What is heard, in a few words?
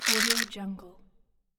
pill box shake